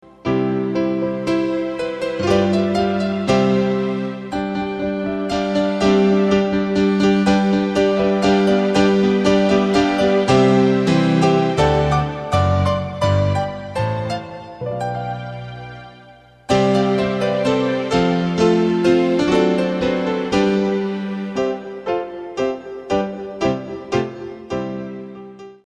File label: Instrumental